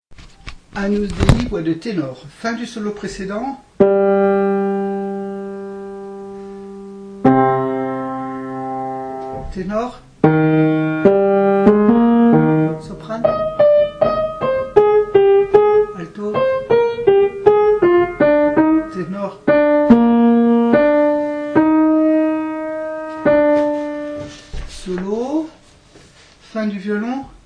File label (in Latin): Agnus, Tenor; Niccolò Jommelli, Missa pro defunctis; 20. Agnus Dei